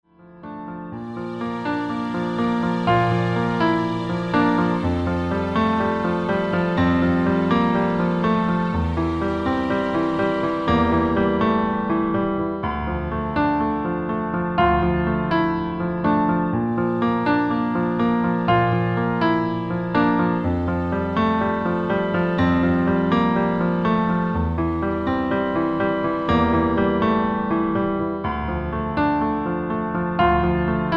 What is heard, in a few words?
Strings; F; Streicher; Instrumental